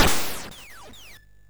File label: MOS6581R2, sid; Defenders of the Earth